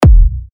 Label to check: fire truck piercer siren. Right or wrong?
Wrong